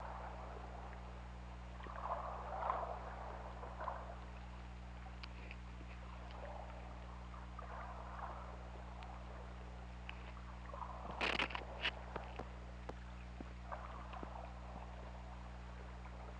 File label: Orca; OrcaLab